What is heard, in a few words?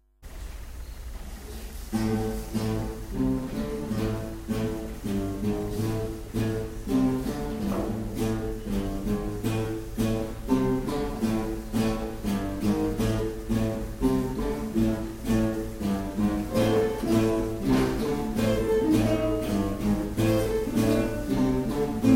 Guitars